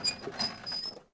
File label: Chariot Squeak; Civ4 warlords